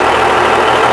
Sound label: diesel; WhiteOut